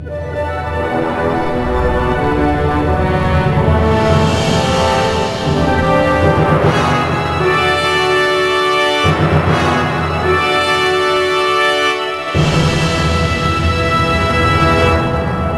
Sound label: Music Mission Succeed; legend of the guardians the owls of ga hoole 2010 nds